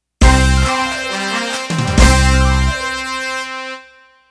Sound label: Shuffle End